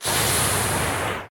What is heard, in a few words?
fungus balloon spit